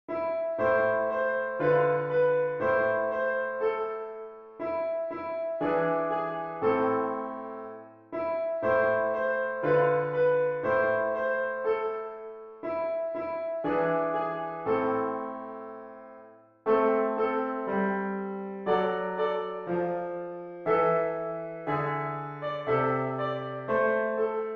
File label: piano